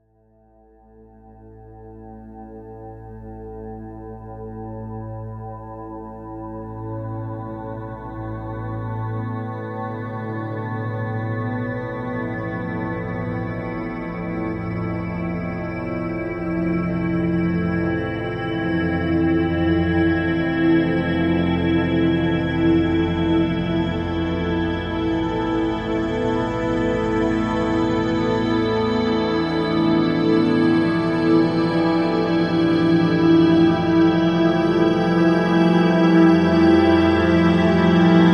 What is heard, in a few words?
Synclavier, Vintage; Arturia